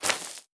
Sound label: run ground stop